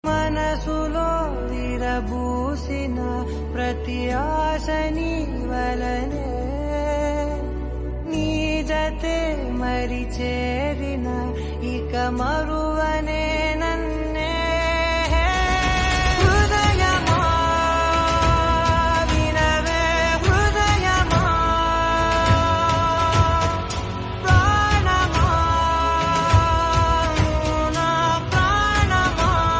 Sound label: Telugu Song Ringtone